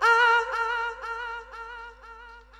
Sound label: Vox; TRUTH-HZ; Crowdsourced Sample Pack